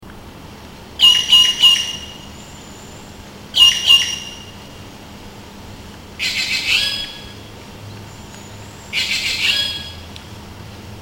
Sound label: Cissa thalasina; silentforest